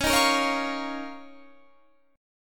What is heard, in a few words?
F 7th Suspended 4th Sharp 5th; 21,18,20,18,18,21